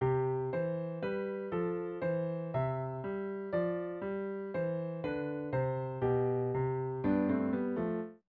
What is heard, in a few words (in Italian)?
Andante in do maggiore